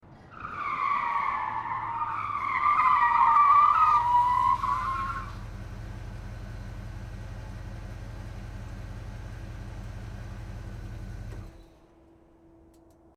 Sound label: muscle car highway skid